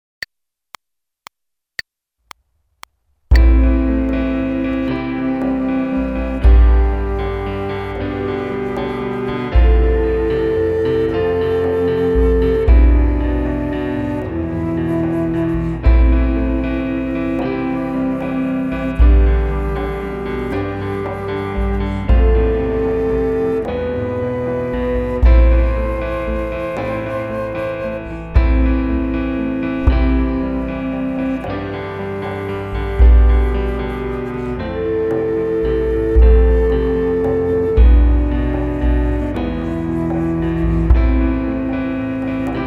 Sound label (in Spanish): Greensleeves Cuarta voz Flauta